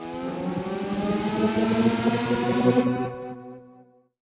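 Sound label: alarm siren, modulation processed, 170bpm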